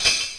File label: bell